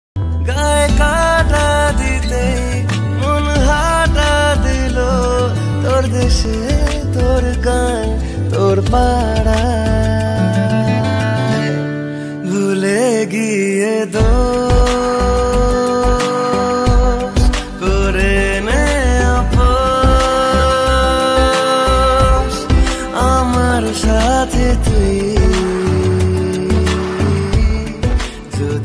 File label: bengali ringtone